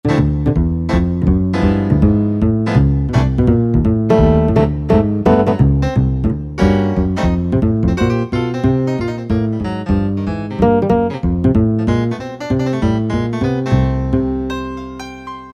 Bass & Piano, E-mu PK-6

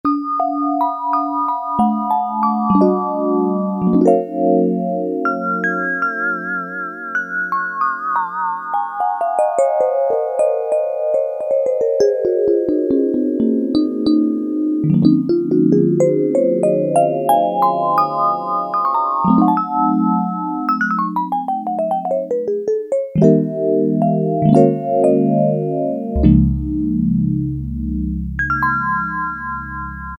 VIBE 1, Yamaha DX7

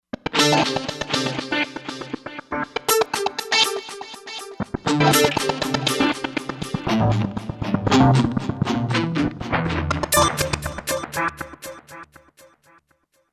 GTR Heroes, Roland Fantom XR

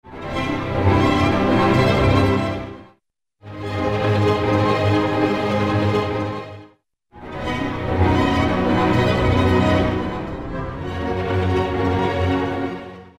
Strings Arpeggios; Roland L-CDX-04 Strings And Orchestral Percussion

